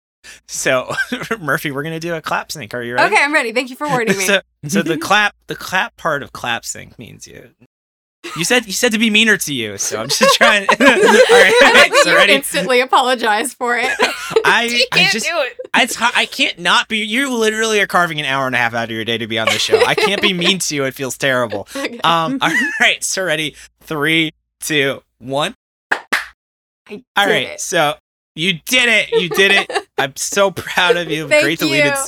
Claps; Ayashimon Ayashimal Monsters

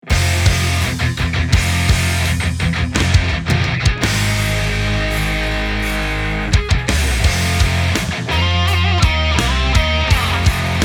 Metal Rock

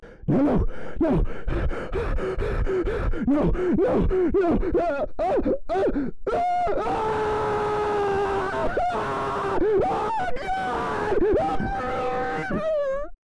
scream muffle

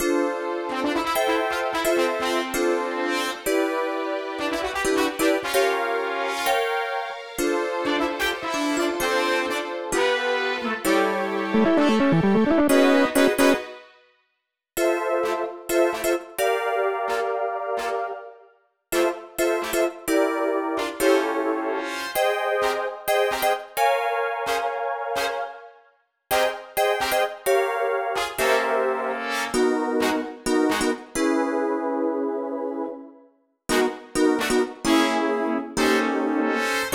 Melody and Horns; Pac-Man Arrangement

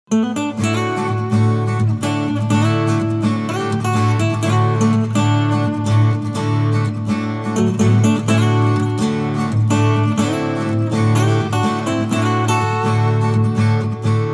Acoustic; Normal Duel Pokemon TCG

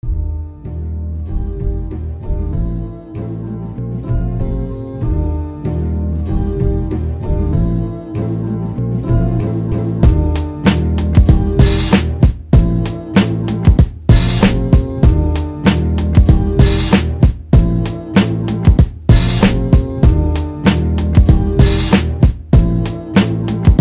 Hip Hop Sketch; Touhou

